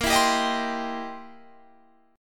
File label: C 7th Sharp 9th, A sharp